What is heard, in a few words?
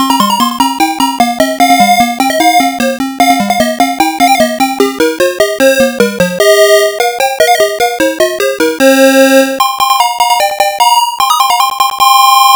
arcade background music main; yie ar kung fu